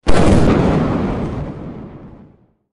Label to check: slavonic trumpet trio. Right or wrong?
Wrong